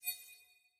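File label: notification chat received secondary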